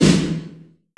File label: LARGE ROOM 909, REVRB; TR 909